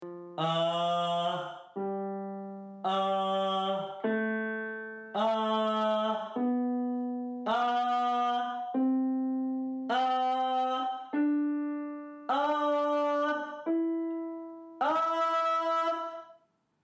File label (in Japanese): 純粋な地声発声